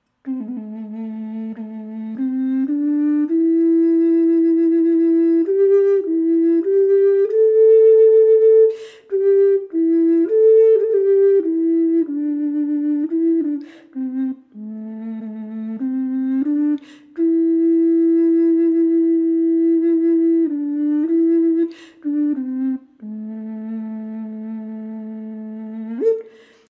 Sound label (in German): Indianisch Bass A, ocarina; Indianische Ocarina